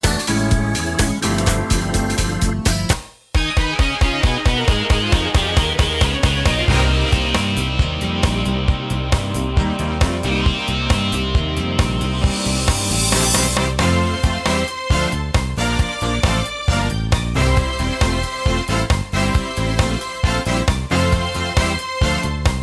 DISCO

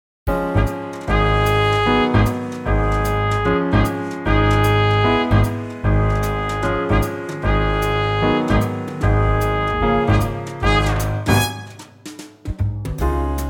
Db quartet swing

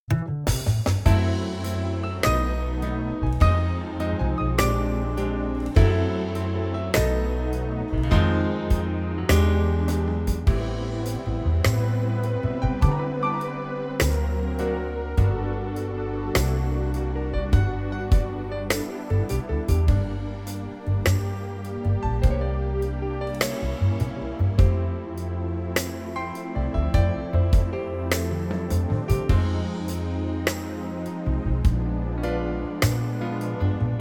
Laura smooth

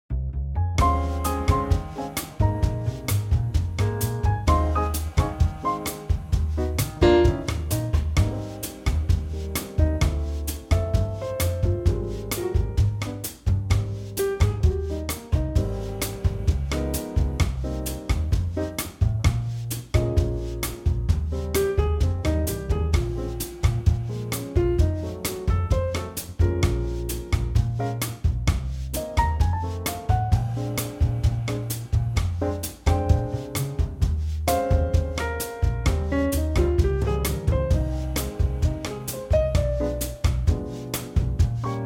Ab Bossa

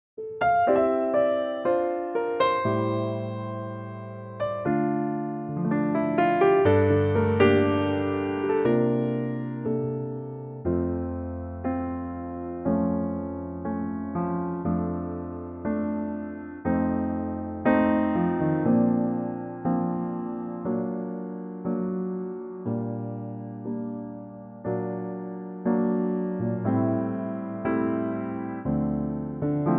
F piano trak

